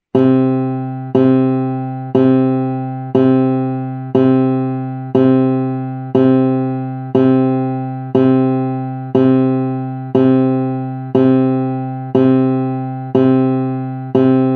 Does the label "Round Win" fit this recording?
No